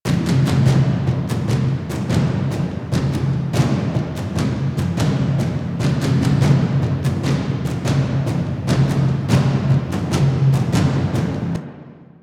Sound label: Loop Music; Warframe; War Within